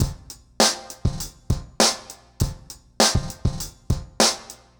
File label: Remixed Drums 100BPM; The Roots Drumloops